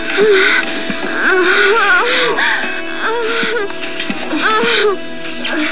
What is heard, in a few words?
orgasm